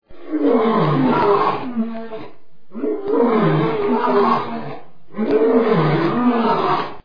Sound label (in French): Le lion rugit; MarcelJoseph